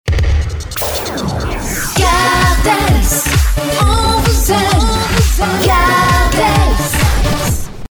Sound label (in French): electro